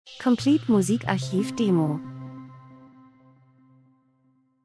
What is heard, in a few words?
transition; Transition & Movement - leicht fliessend angenehm